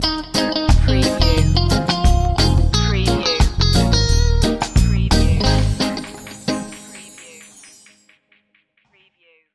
soul of reggae stinger

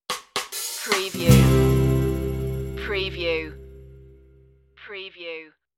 reggae roots stinger

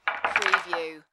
Billiard, Pool, Snooker - Put balls in frame

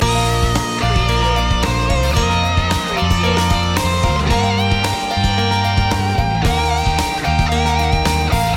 loop chorus solo

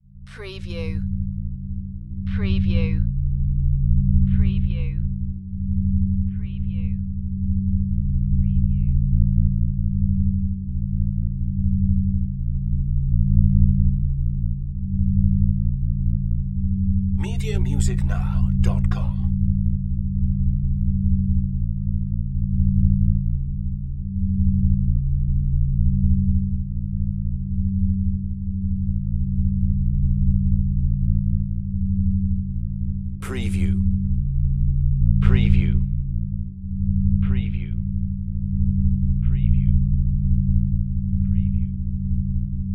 Magnetic Field Drone

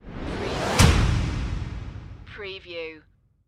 Whoosh; Sound Effects Bible

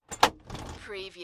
Helicopter Door Open

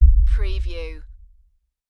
LFE Hit

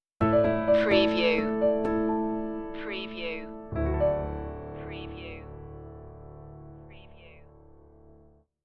how far can we go stinger

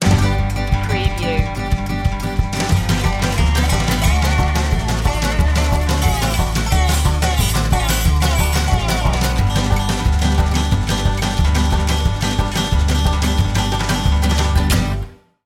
hickabilly hoedown

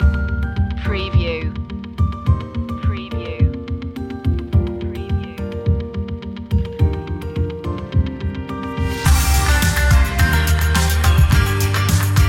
the feeling you love no vocals

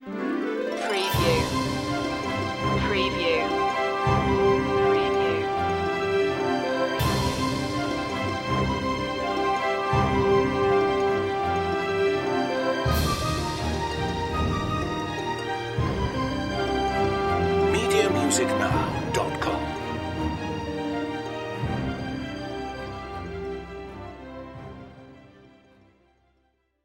excited

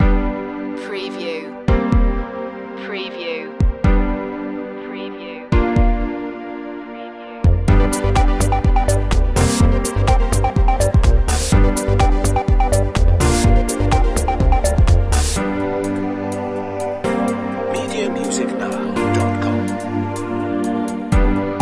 groovy background

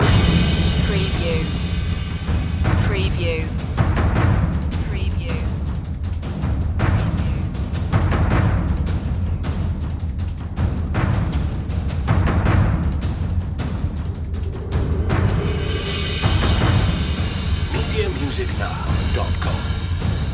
lords of chaos percussion loop

